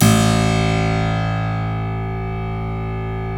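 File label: A0 clavinet left, Keyboards Acoustic; USB Soundscan vol.17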